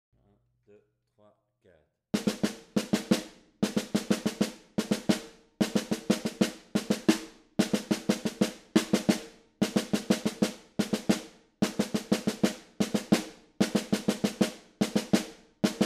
Afro Ternaire 3x3 Snare, Batucada

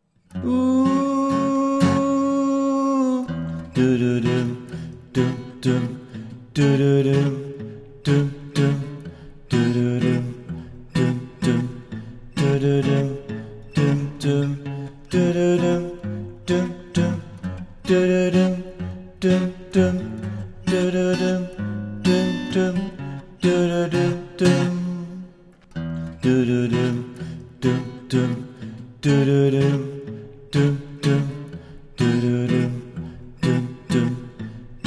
Voix Rythm; Porceaugnac

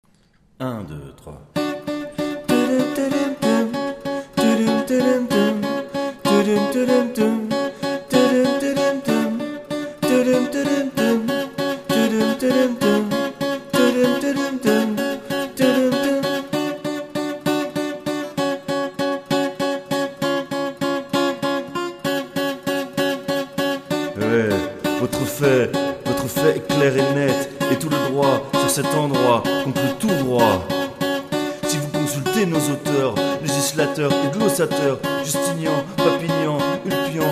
Voix Lead; Porceaugnac

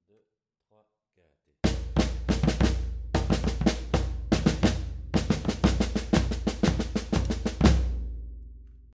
Coda Ternaire, Batucada

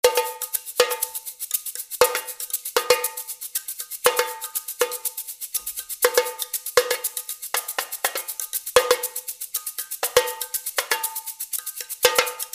Afro Cubain Tamborim, Batucada

